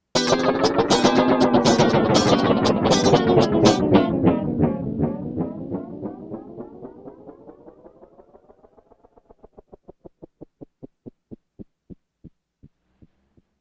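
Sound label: rhythm guitar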